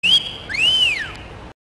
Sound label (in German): Nachpfeifen